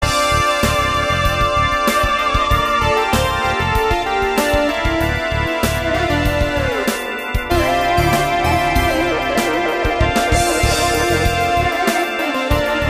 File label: drums